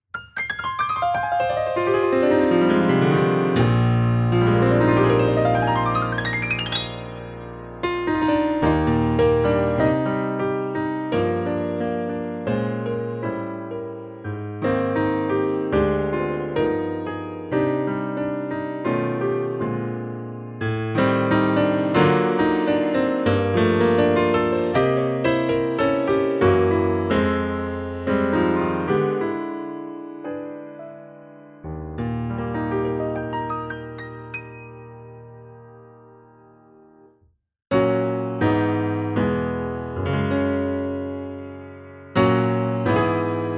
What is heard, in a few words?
Black Grand MkII Close Perspective